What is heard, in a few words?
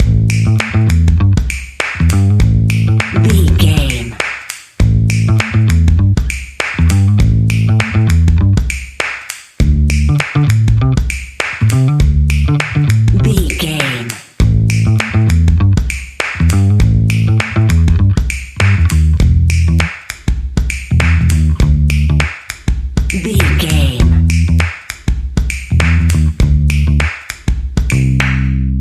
positive fat bass intro